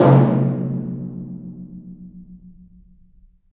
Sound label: Af. Hand Drm, Ambient